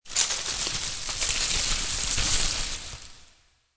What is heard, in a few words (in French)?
FROTTE; MOBICLIC150